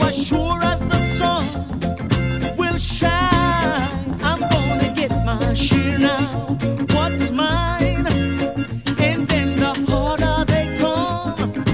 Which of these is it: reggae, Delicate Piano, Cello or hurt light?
reggae